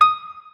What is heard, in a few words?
piano